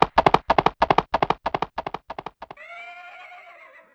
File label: Gallop (Fade Out), Yamaha DD-35